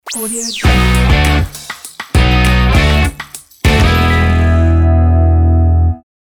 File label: stylish hip indie rock ident